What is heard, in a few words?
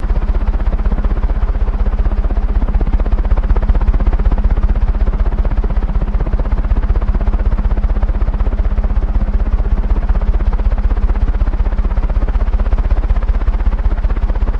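Heli Blades Overhead, helicopter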